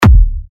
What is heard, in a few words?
TECHKICKS C#, techno kicks; Hakai; Revealed Techno Kicks Vol. 10